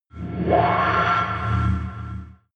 alien metallic scream buzz reverb; aliens

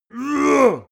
male attack strained; schreie; menschen

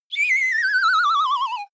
whistle slide tremolo; bewegung; comedy-cartoon; soundpool

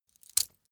metal pouch impact rich

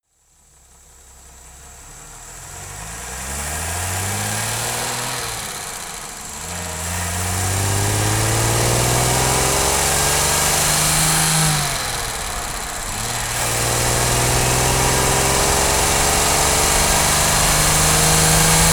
car jaguar e-type accelerate decelerate engine

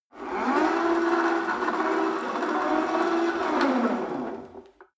rope trainer pull sequence medium, fitnessstudio; sport freizeit

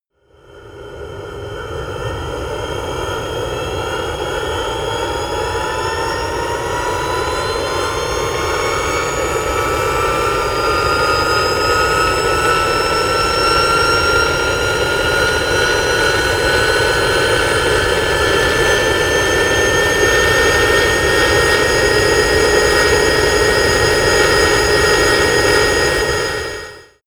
plane bombardier private turbine rev up; flugzeuge verkehrsflugzeuge; verkehr transport

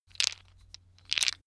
tiles wood clatter; objekte; holz

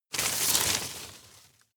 rustle hard short; berufe; foerster

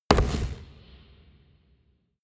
gun pop gun pop; schusswaffen

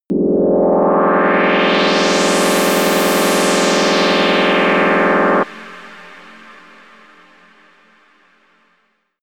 dangerous universe pad; sweeps-swells

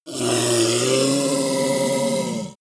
ogre grunt painful short; kreaturen

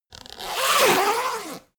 zipper midsize run; objekte; reissverschluss

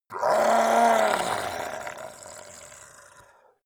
monster dark growl; kreaturen

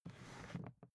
briefcase plastic slide; industrie wirtschaft; bueroarbeit

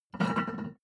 plate on plate take hard

